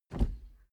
suv pull back gear straight soft damped; verkehr transport; autos

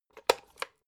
electric whisk insert hook short; haus-garten; kueche

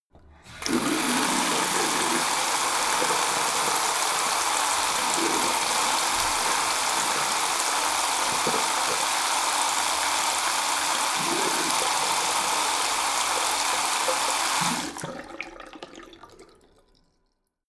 tap flow hard long; haus garten

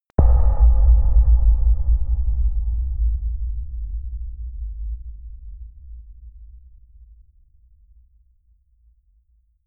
sub impact; hits impacts

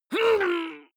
male fight pain groan; comedy-cartoon; soundpool

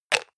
hygiene small cup close hard, badezimmer

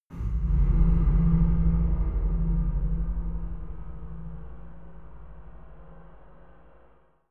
scary metal chime

